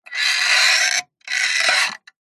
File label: metal stress creak thin tone; zapsplat